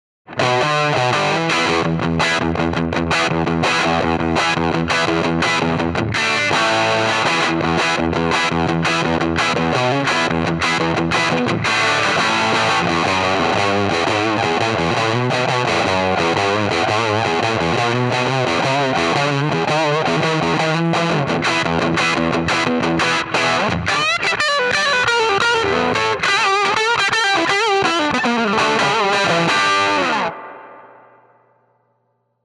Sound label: Les Paul EL34